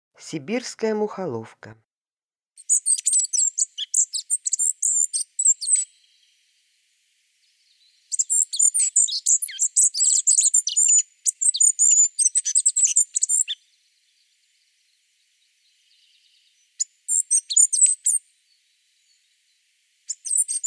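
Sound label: dark-sided flycatcher voice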